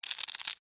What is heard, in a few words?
Insect Move Click, Movement, Clicks, Bug; Animal, Insect, Movement, Clicks, Bug; Insect Movement Sound Effects